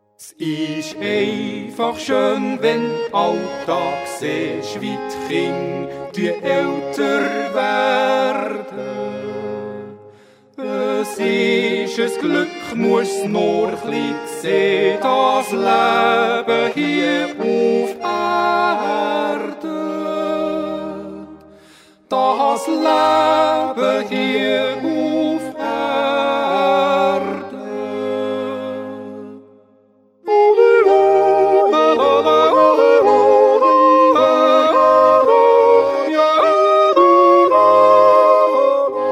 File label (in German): Duett